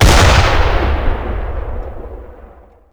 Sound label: mortar fire 80mm; WAR